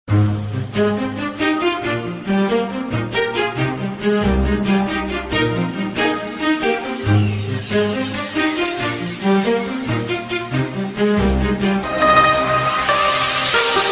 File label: gymnastics floor music